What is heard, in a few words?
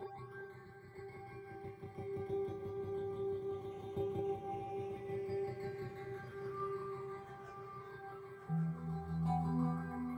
Sci Doc Instrumental Cue